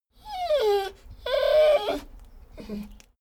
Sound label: dogo argentino whine whimper; natur-tiere; hunde